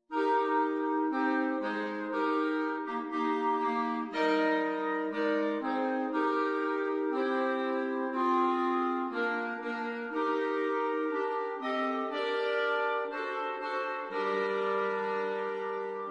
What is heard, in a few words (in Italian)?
quartetti